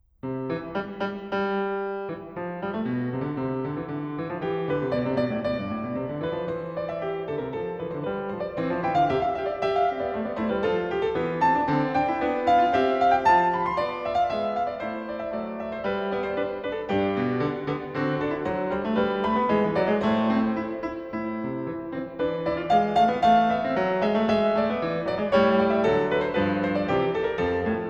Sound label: Fugue in C major piano; Ludwig van Beethoven - Complete Works [Brilliant Classics 100 CD Box]; Hess 64